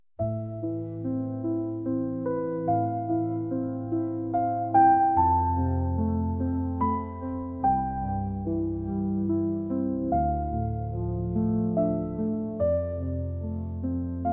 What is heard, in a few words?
calm